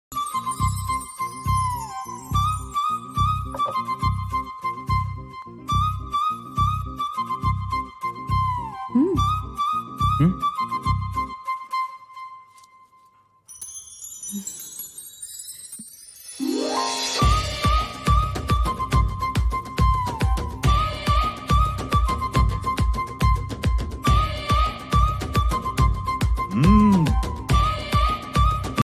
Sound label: love bgm ringtones; zombie reddy